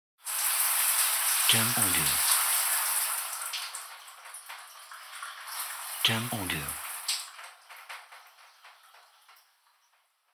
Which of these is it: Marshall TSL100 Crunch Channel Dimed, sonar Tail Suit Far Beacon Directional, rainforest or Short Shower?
Short Shower